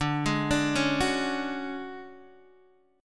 D Major 7th Flat 5th Guitar DADGAD, chord

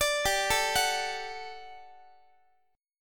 G Major 7th Suspended 2nd G down Guitar; GM7sus2; arpeggiated; x,x,17,17,13,17

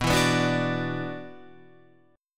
F sharp 7th Suspended 4th Sharp 5th B

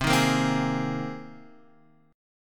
C Minor 7th Sharp 5th, Guitar, Half Step Down

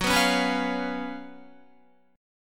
Gb Major 7th Suspended 4th Gb, Guitar; GbM7sus4; strummed; JGuitar

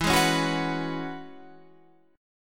E 6th Add 9th E down Guitar Half Step Down

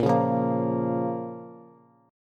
A sharp Minor A sharp down Bass; strummed; JGuitar

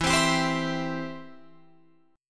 E Minor Sharp 5th, Guitar, Open F; Em#5 strummed; JGuitar

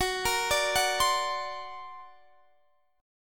D Augmented 7th F sharp, guitar

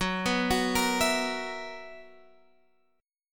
B Major 7th Suspended 4th, guitar chord

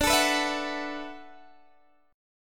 D 6th Add 9th, Guitar, Open G

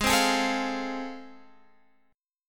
A Minor Major 7th Sharp 5th, G sharp

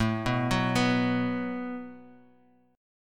F sharp Minor Double Flat 5th A

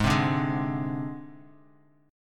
Ab Major Flat 5th Ab Bass Standard; AbMb5 strummed; JGuitar

